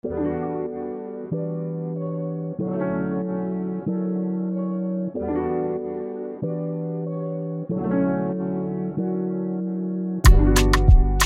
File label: Afro Melody Loop Bmin 94 BPM